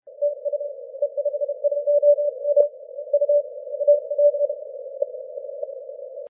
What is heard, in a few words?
17m cw